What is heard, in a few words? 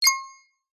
multimedia alert notification glassy flick short